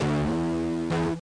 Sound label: passed